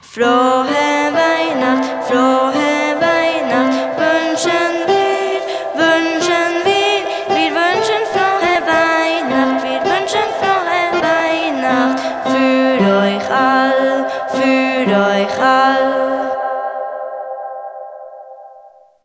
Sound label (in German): pausenglocke frohe weihnacht